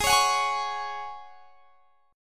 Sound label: G sharp 7th Suspended 4th; G#7sus4; x,23,23,23,21,21; strummed